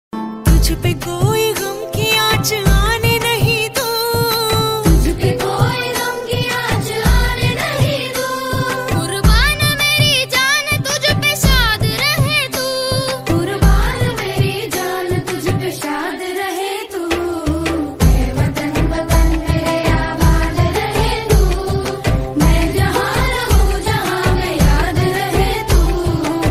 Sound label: Female Version Ringtone